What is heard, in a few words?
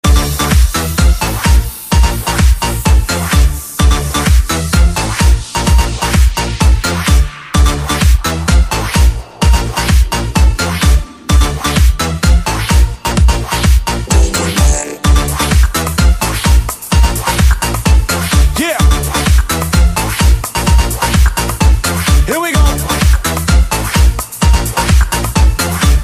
Best Electro Music